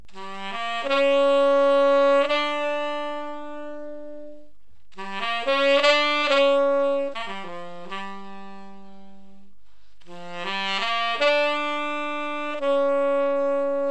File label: sax intro